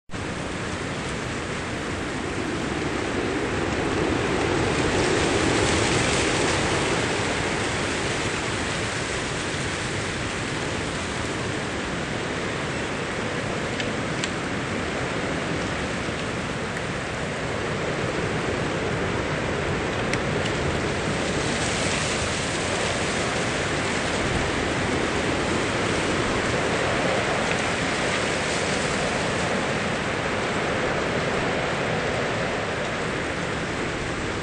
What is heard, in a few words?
winter wind, nature